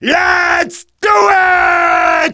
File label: battlecry; Demoman